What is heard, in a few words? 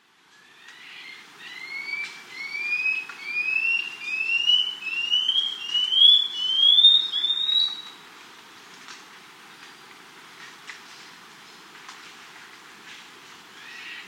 Scale-Backed Antbird; S. America